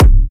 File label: bumpin trap bass drum c sharp key; kick